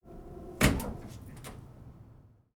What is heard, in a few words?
draft through door